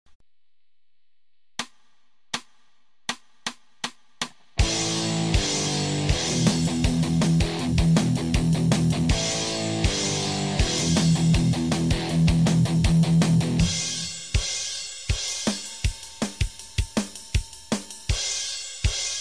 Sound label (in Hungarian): rock riffek alap, gitár